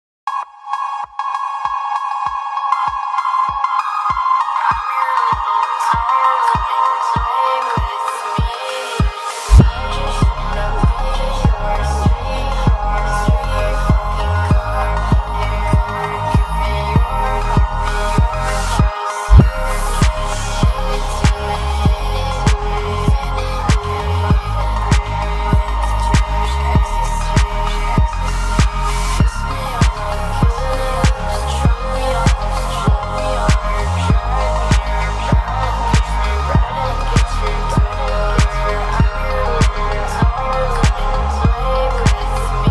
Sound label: phonk remix